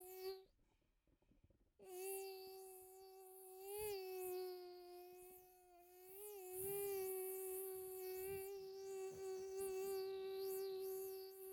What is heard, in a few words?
Mosquito Noise